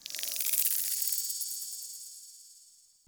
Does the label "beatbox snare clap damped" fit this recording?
No